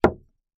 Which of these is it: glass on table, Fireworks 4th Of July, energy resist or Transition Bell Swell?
glass on table